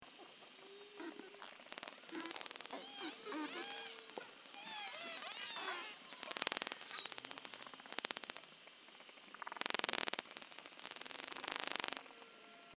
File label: Spinner